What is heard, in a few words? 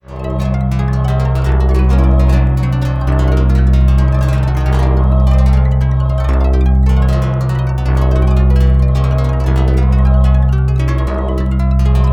horror